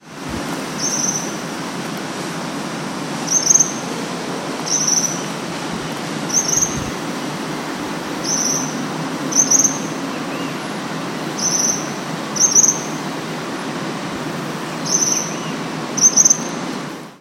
Tyrannus melancholicus; Inst Butantan; SP; Silveira

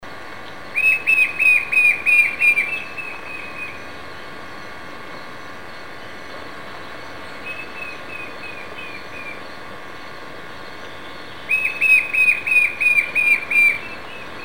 Pyriglena leucoptera; Itatiaia NP; Brazil; A Silveira

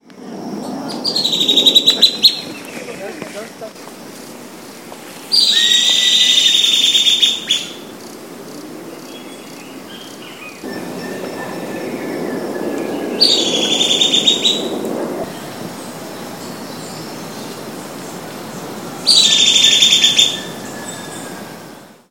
Xiphorhynchus fuscus; PE Cantareira; SP; Silveira

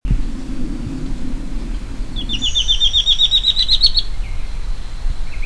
Conopophaga lineata, Brazil; Faz Mandala; SP; Silveira

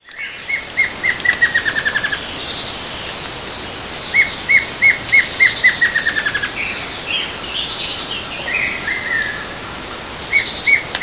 Dysithamnus stictothorax; PN Itatiaia

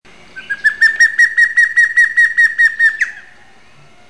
Batara cinerea; Itap da Serra; SP

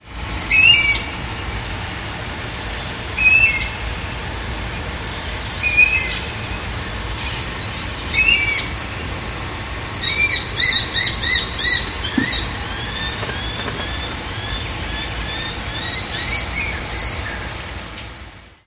Guira guira; I Comandatuba

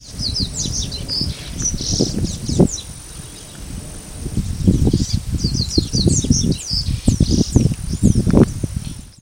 Sporophila caerulescens, Mongagua SP; Ag de Campos

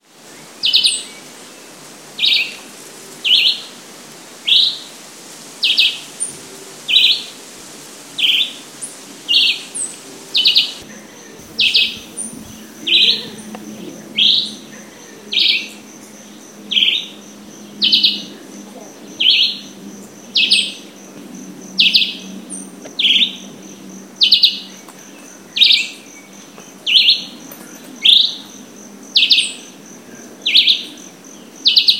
Vireo chivi; PE Cantareira SP; Silveira

